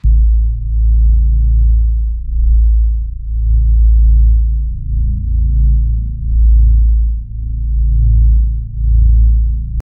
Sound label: dark ambient drone deep